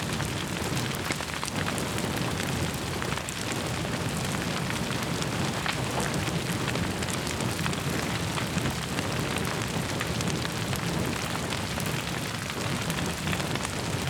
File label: fs Volcano Ambience; netent